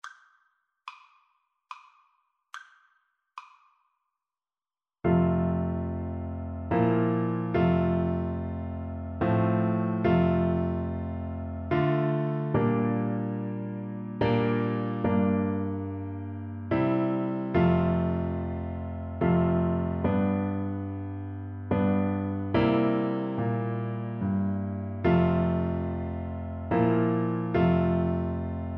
away in a manger, Tuba

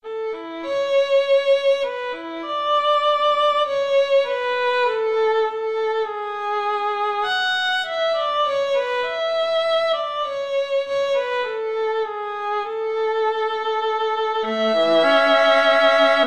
string trio

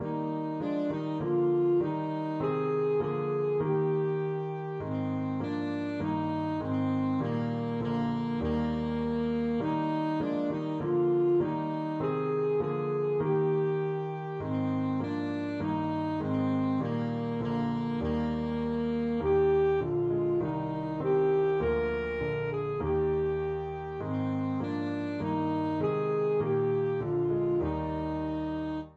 saxophone

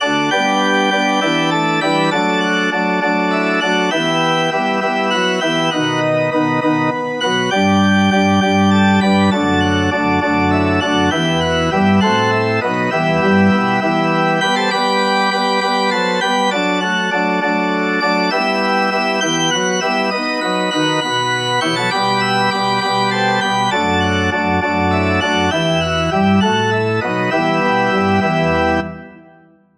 organ

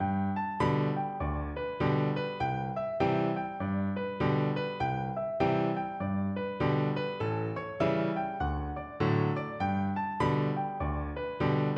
PNO

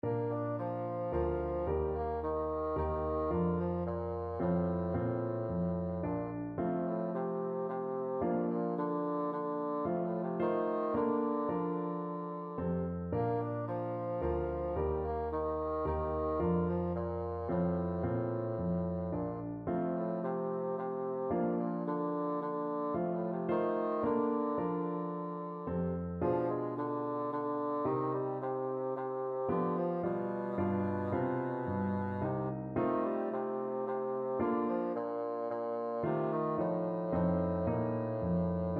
bassoon

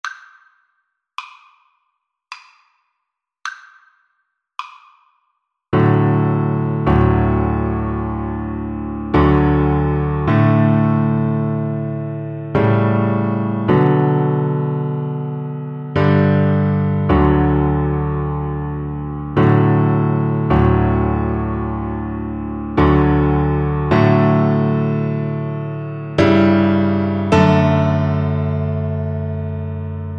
amazing grace, Double Bass

